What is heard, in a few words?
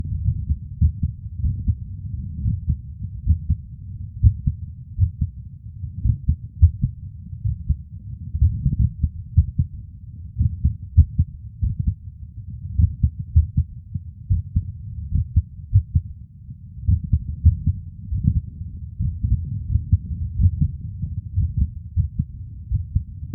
BRADYCARDIA WITH ISOLATED VES, Heartsound; Heartsound Library